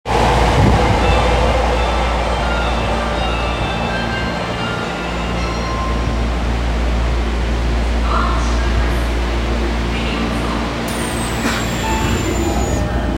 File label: train sounds; 有楽町線新富町東